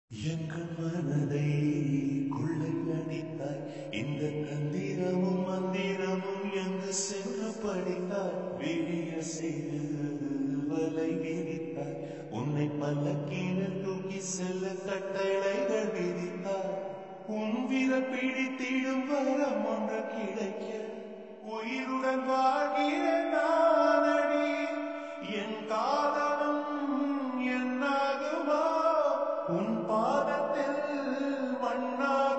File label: love bgm ringtone